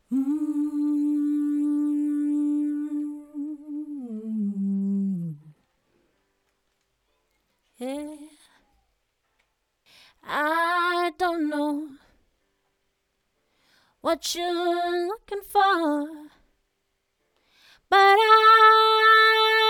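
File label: pop RnB 160 D minor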